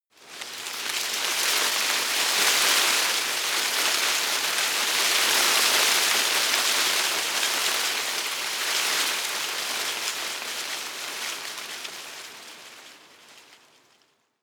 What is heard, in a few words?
tree small leaves rustle heavy